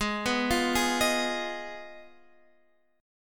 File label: G sharp Minor 6th, Guitar Drop D; x,11,9,10,9,11